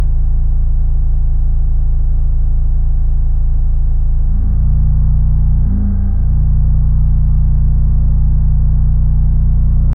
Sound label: sound for avas, electric vehicle